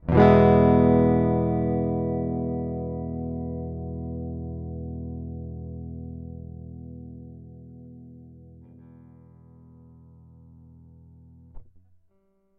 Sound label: Pattern with electronics